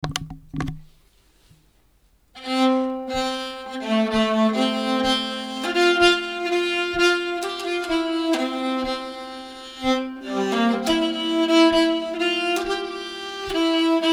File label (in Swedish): visan i F stämma; Östbjörkavisan